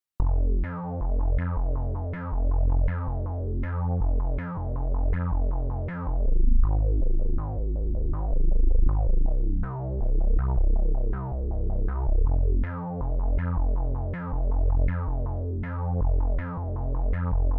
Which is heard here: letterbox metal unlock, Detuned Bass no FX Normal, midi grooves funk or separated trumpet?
Detuned Bass no FX Normal